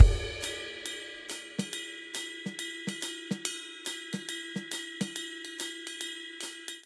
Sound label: Swing Jazz Verse 140 bpm, Drum; MIDI Clips; Ableton; Factory Packs; Drum Booth